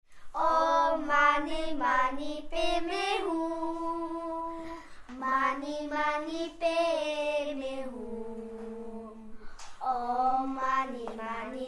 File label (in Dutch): gezongen mantra